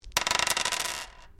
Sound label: Dice Medium Pair Glass Table Throw; Variety of dice sounds; Casinos